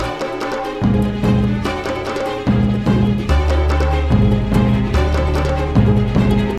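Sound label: ocean world music shooter no guitar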